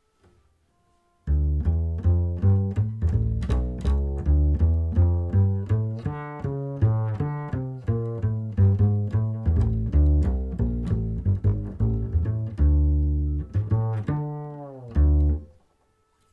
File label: string Bass C3